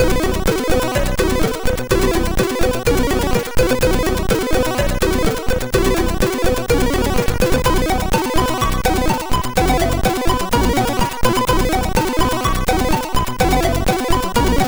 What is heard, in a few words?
sid, MOS6581